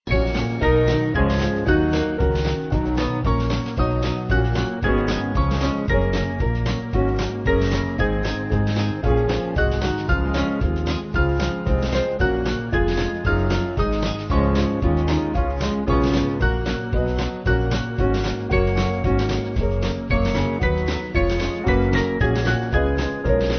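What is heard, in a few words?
Band, Music Backing Files for Churches; Sine Nomine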